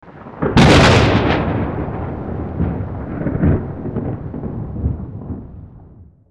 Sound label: thunder; weather; Sound Effects Ringtones (Water Weather)